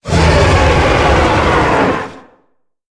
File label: creature squid shark idle